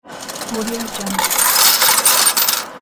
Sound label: money coins falling